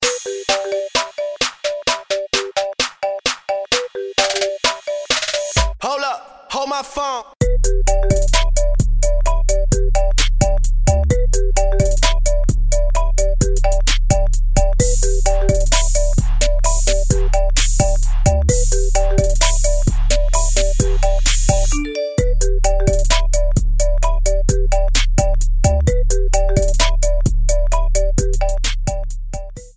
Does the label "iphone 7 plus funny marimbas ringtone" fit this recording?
Yes